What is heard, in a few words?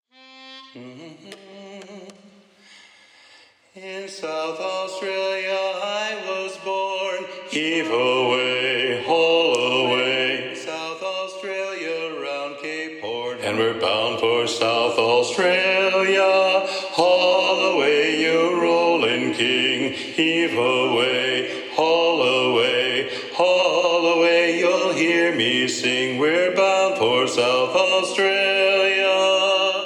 Bass Predominant; sea shanties; Bound for South Australia